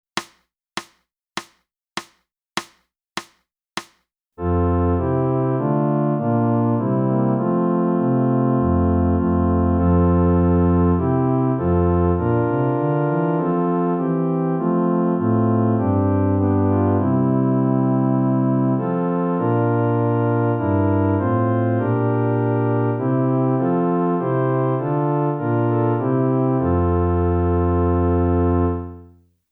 flute; boosey

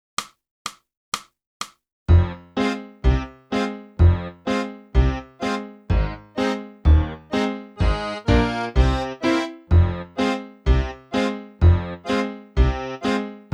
sax

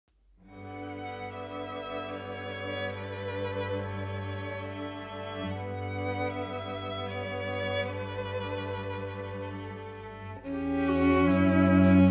SLEEPY

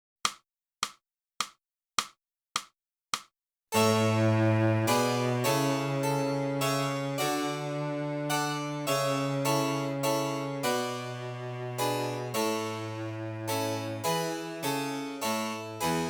flute, minuet; Boosey Woodwind Method: Flute (Book 2)

